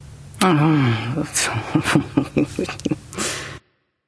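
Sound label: grumble, female; smite; voicepack; hera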